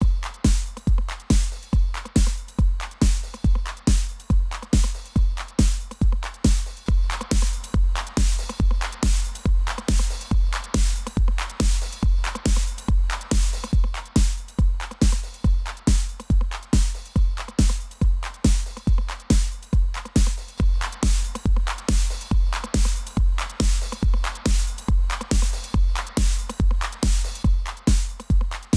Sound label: att Fast, rel 200, Hard, Flat, (16dB gr)